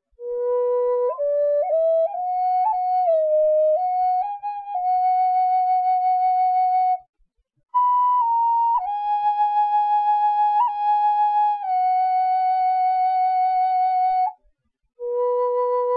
Medium B Birch, flutes medium

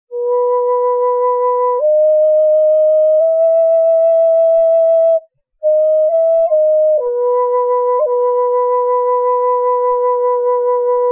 Medium B Birch, flutes

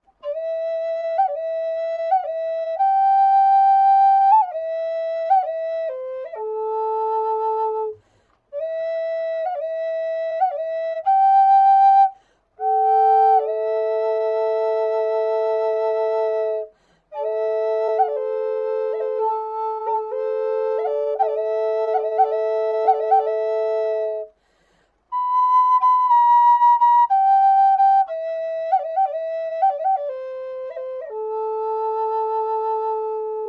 Medium A Maple Drone, flutes; FlutesDrones

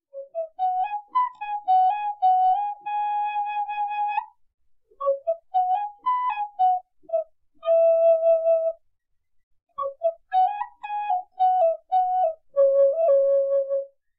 Native American Flute Medium C Sharp

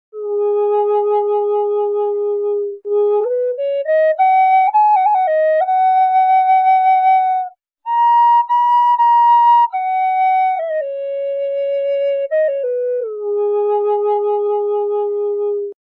Large Ab, Flutes Long; Red Heart

